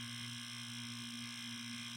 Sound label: Music Box-Foley (Drive-Loop)